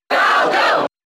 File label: Cheer English; SSBB